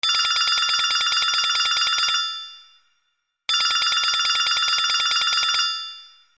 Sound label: Old Phone; cellulari